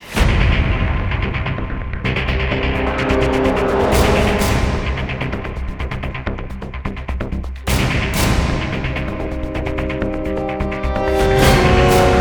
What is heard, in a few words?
Round Start; Universal